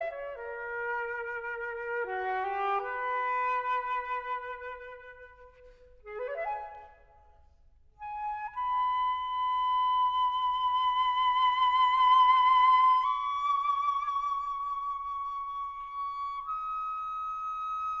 Syrinx, solo voor fluit

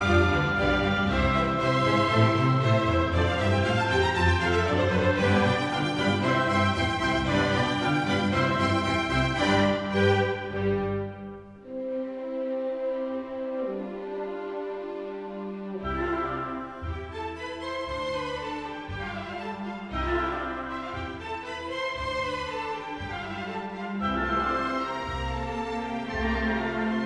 Allegro ( Concert voor fluit en harp in C ); KV 299

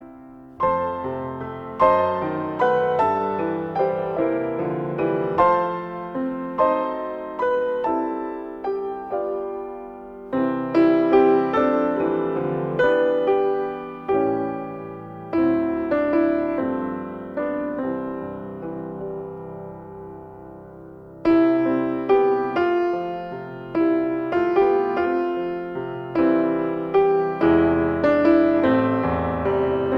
instumentaal

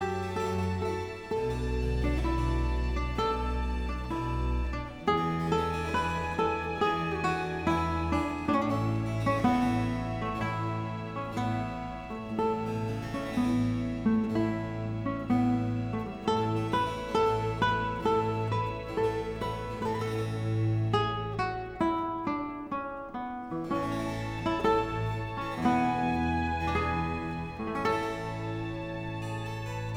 Gitaarconcert in D-dur Largo; RV 93

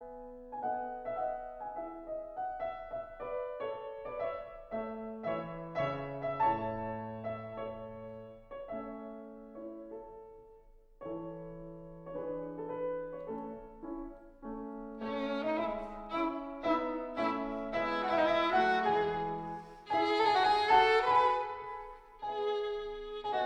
Andante (Sonate voor viool en piano); Schubert

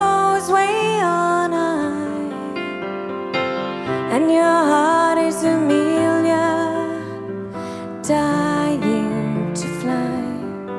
applaus

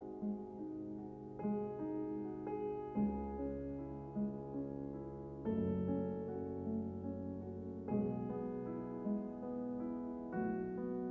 Piano Sonate Mondschein sonate zwaar; nr 14

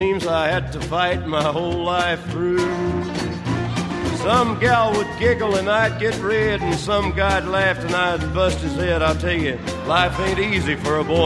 applaus

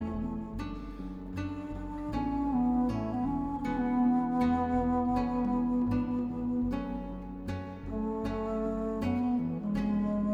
panfluit Instrumentaal

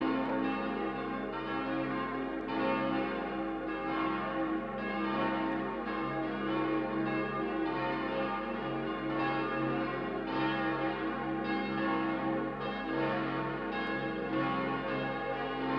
Kerkklokken - Monastery Bells

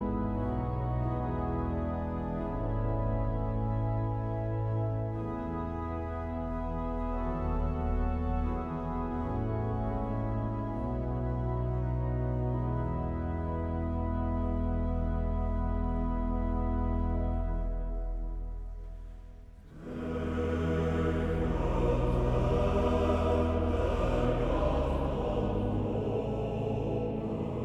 Koor mannen

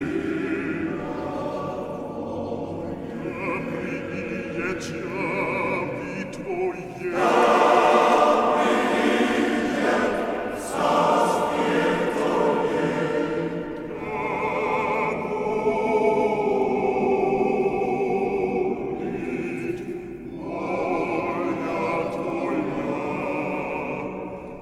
Koor (mannen); Amersfoorts

